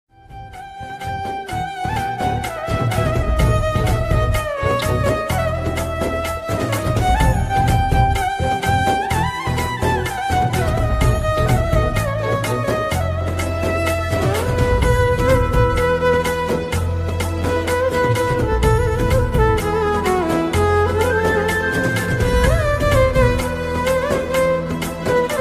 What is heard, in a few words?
ringtone flute ringtone love ringtone; kantara movie